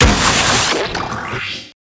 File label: Heat Seeking Missile